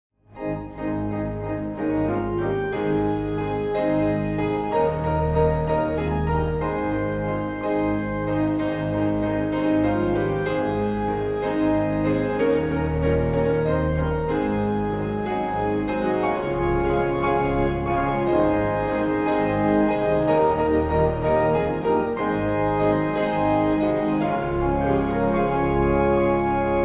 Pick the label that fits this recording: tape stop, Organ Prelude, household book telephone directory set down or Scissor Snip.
Organ Prelude